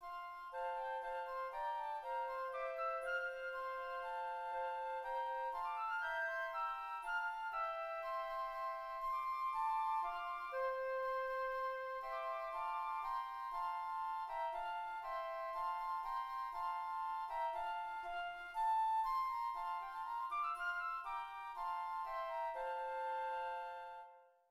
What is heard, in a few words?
flute